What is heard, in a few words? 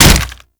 Infested Ancient Arm Attack Hit